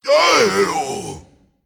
Male Dragon Drakonid Death

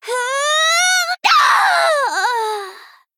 Female Gnome Death

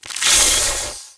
Scarab Attack

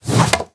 1H Staff Underlay Play

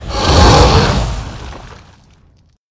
Volcanosaur Attack

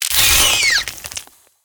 Jeweled Scarab Death

